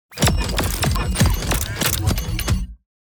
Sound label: Steampunk engine mechanical process various gears